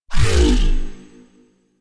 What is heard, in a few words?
UI Corpus Theme Open; DE; Warframe